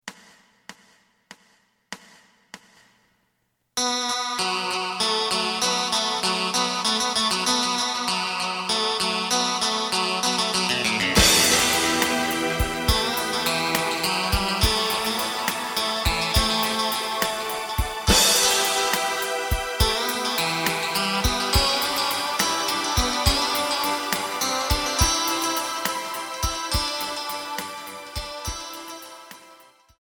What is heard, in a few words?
bass